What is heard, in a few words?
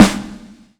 SN, S. DRUM, House Side Of 2 Step; USB Soundscan vol.51; AKAI